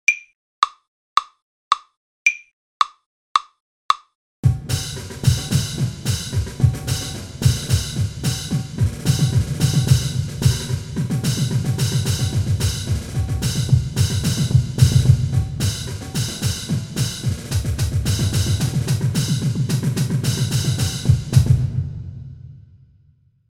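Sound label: Rhythm Dictation cymbals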